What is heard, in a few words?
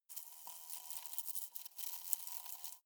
hornet hum glass